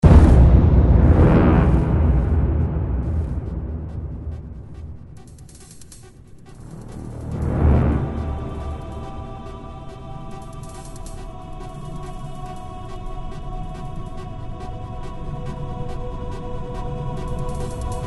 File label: mainmenu; warcry remix